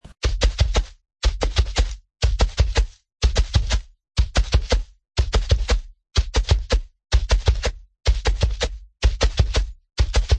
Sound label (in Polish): Koń na miękkim podłożu; C01 03 Kon miekkie; na farmie